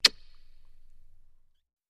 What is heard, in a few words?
Kiss Echo; kissing